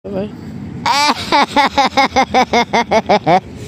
laughing

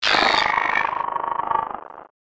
crt tv roars; Viral Soundboard

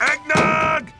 screaming

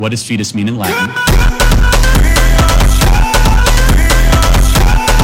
phonk

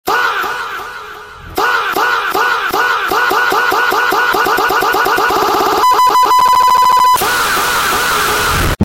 boosted faaah; Viral Soundboard

